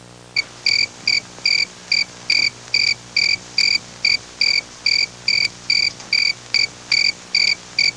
Nightbug chirping

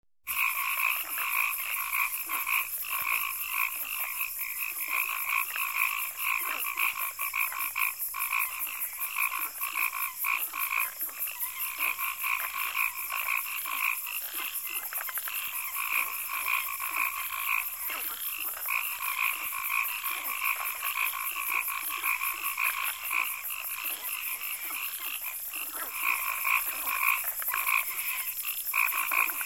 Frogs on pond with cicadas in background

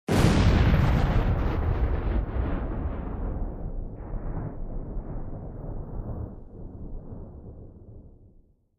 Bomb explosion up-close

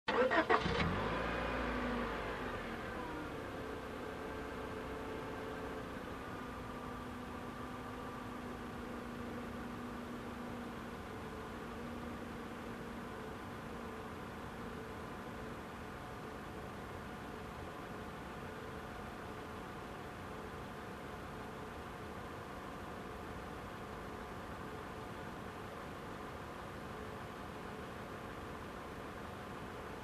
Car engine ignition and running idle

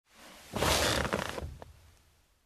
Sitting down on leather armchair

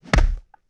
Straight Punch on boxing dummy

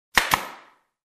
Wall Stapling

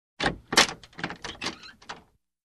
Wooden Door open close

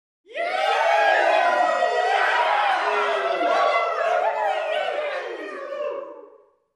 Crowd ecstatic and cheering

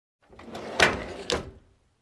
Opening Drawer

